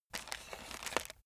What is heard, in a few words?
chocolates take